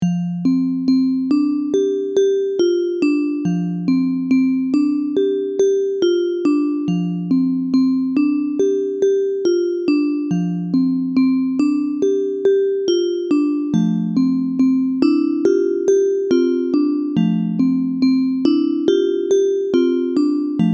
Bells Picnic, Ambient Bells

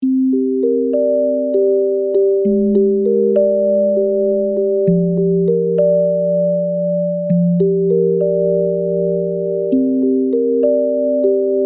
Brushed Bells Leaving Home; Ambient

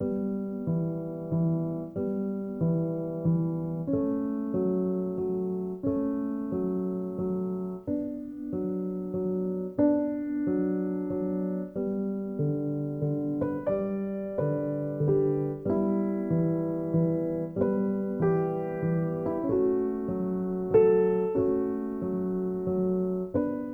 Great Egret, Piano Solos

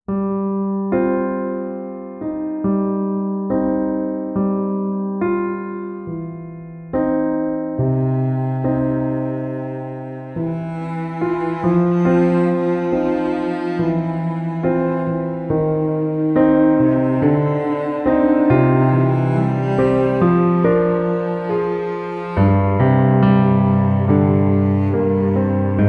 Slow Sad Loss Gentle Piano Cello; CUES for Film TV Games etc SOLO PIANO

